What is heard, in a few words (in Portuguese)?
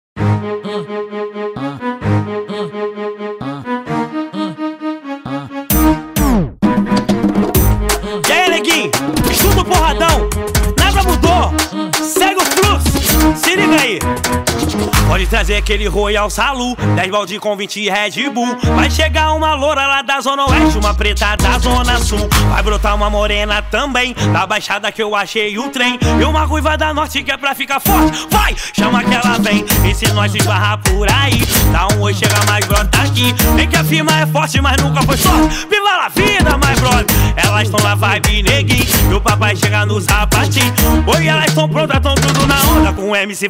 explode funk